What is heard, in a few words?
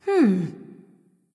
thinking; smite; terra